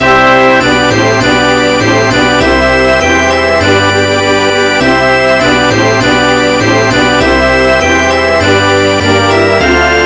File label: Russia presidential anthem, MIDI